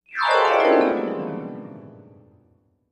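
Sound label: Music Transition Vibraphone; mallets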